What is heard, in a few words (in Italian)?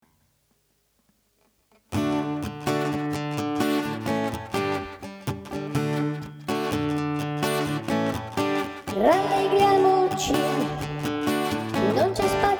rallegriamoci donne